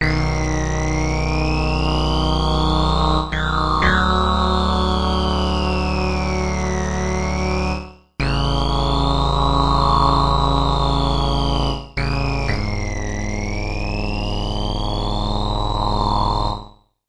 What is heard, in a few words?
melody, SYNTH AND ELECTRONIC; MELODIC SAMPLES; spaceballoon